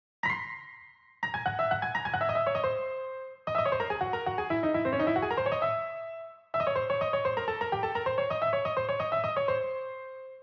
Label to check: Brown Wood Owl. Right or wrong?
Wrong